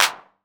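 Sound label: 909 clap; PERC TR; Wizoo - Platinum 24 Electronic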